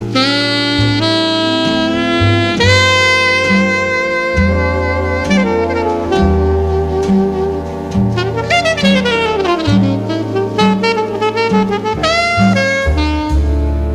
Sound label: jazz